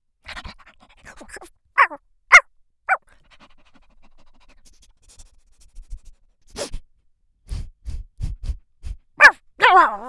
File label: Creature ANIMAL CHIHUAHUA, vocal extremes; Creatre Demo; creatures